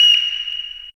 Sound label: XTRA PERC